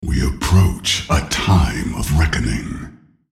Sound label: attack; Nasus; Character Voices - English